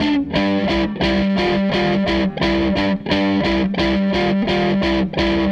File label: Tele Guitar Loop